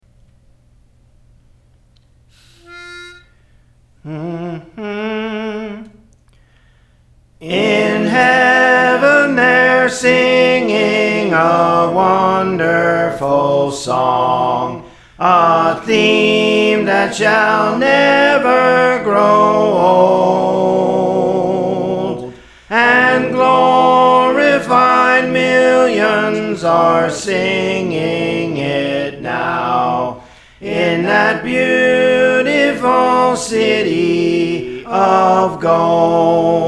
Soprano; Praise For The Lord Training

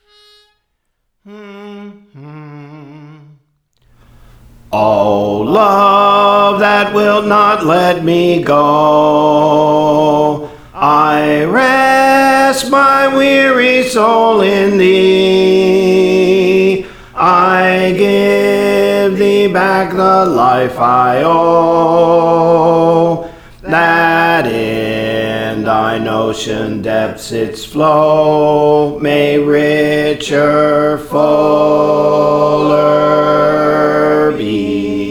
Bass, Songs; Praise For The Lord Training

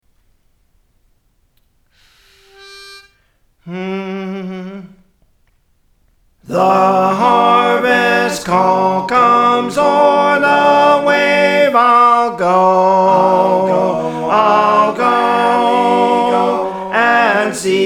Soprano; Praise For The Lord Training

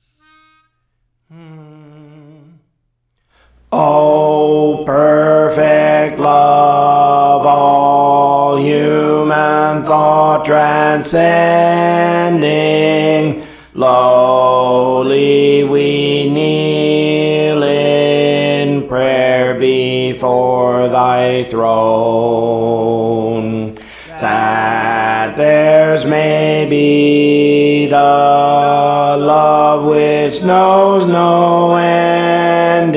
Alto, Songs; Praise For The Lord Training

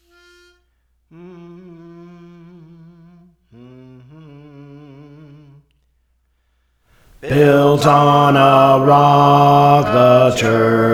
Bass; Praise For The Lord Training

